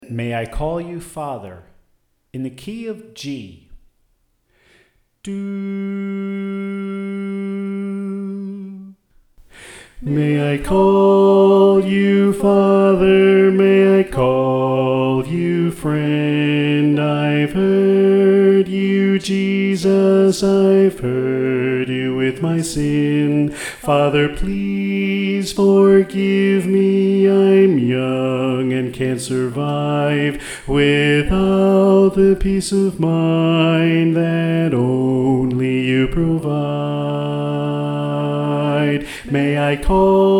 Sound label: Bass